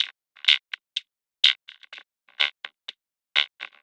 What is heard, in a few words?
perc 125, Percussive Loops 125 bpm; croaked